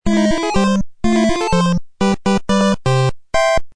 win duck; svencoop

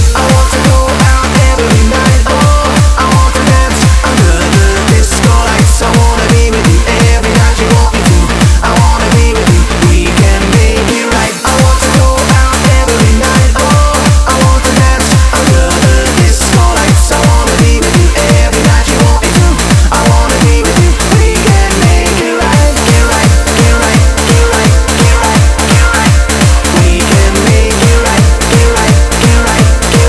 disco lights; tfc; radioloops

